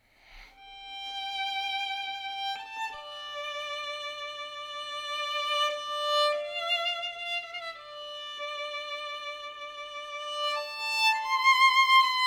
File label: Violin